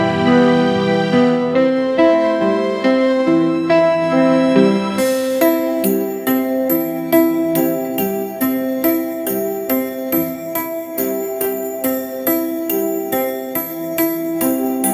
orchestral strings; ai

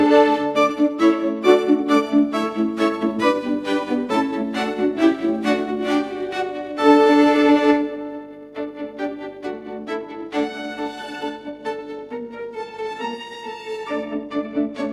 orchestral strings

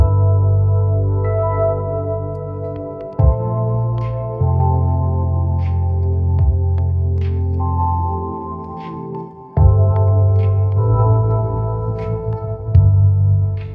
lo fi hip hop

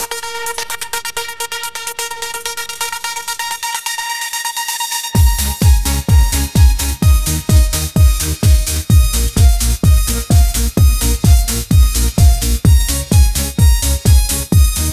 electronic dance music, ringtones

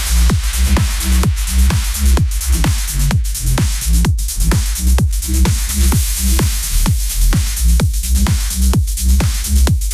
high energy edm festival drop, ringtones; ai

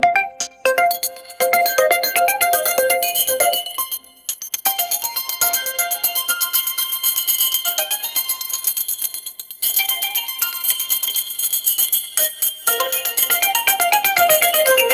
upbeat pop melody

